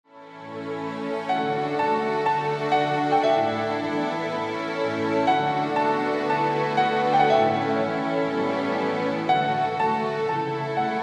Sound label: LULLABY